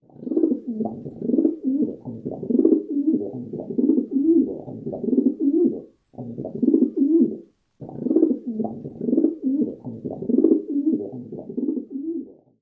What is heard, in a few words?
male cooing